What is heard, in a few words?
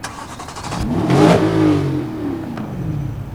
startup, vehicles